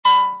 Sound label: clang; Robot Wants Fishy